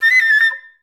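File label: flutes A5; Best Service; Peter Siedlaczek's Advanced Orchestra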